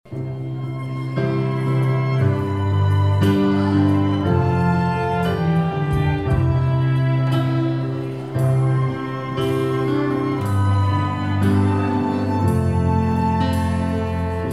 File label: Piano e Cordas